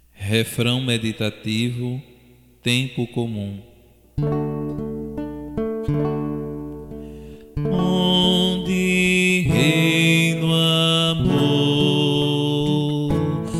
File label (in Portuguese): refrão meditativo tempo comum